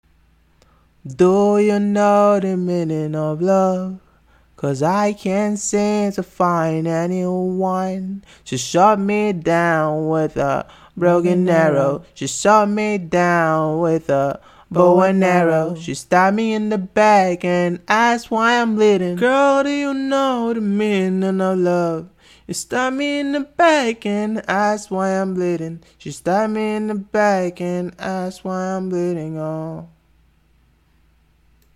chill out, 100, Ab, acapellas

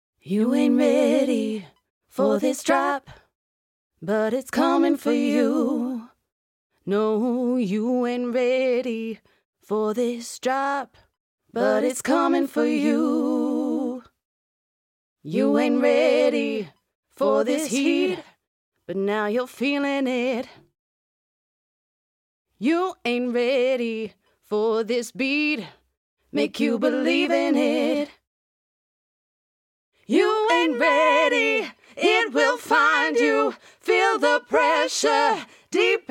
pop house dance 128 BM

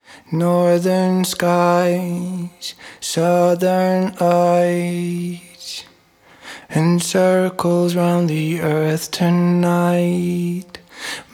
pop dance, 87

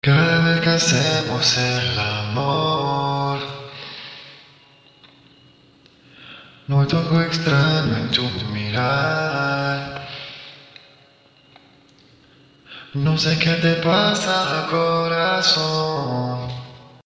rnb 105 C sharp, Acapellas

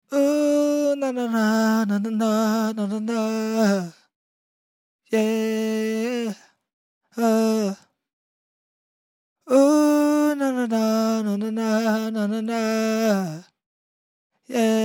RnB 102 GM

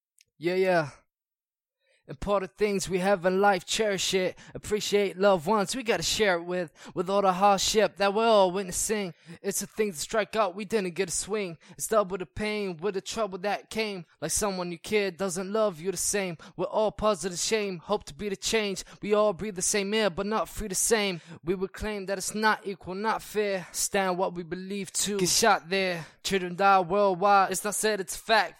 Acapellas, 87 BPM, Key Am; Tattoos

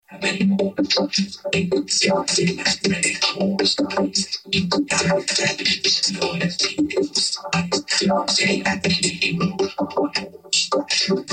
electronic 80 F, Acapellas

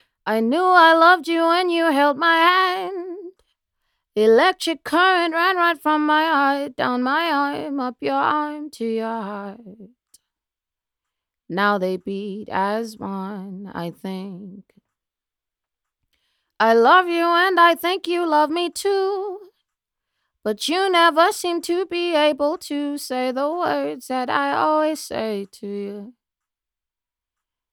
Synth Pop 125 Gm

